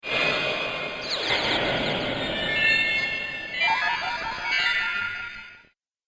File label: Beeps Lasers And More; Laser Sound